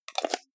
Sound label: jar plastic midsize close fast